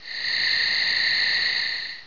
night

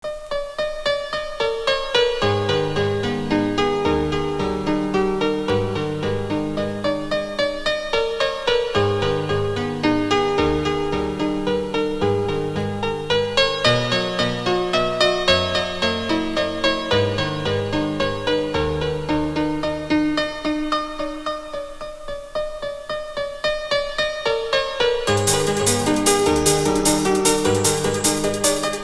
music

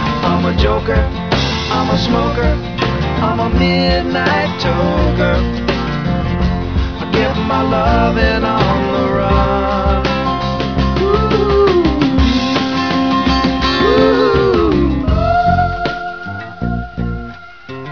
music

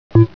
bounce; duckhunt; pong

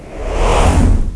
spawn; aura

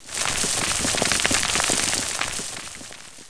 cave in

